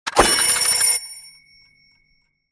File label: clock End; ludo